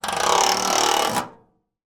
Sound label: foley metal grill scrape; zapsplat